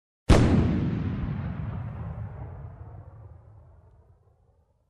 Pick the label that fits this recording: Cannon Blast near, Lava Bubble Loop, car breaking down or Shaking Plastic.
Cannon Blast near